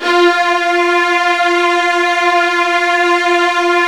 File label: F1, Virtuoso Strings